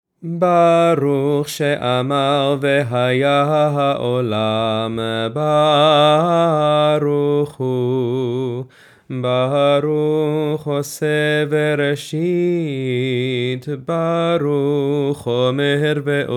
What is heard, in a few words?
Baruch Sheamar Melody; shabbat psukei dzimrah